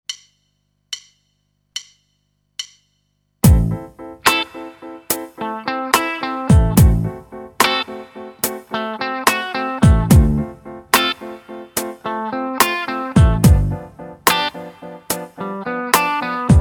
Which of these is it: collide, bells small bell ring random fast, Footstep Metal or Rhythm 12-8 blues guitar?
Rhythm 12-8 blues guitar